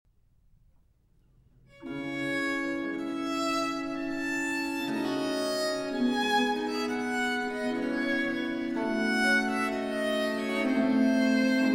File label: Violin Concerto in G Minor; 12 Violin Concertos, Op. 4 - La Stravaganza - Concerto No. 6; RV 316a